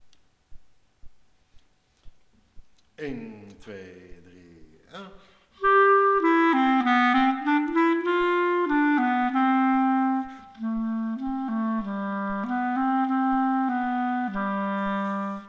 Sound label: the music box; Skilful Duets